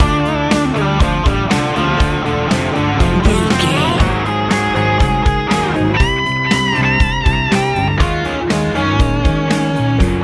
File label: warm hopeful easy rock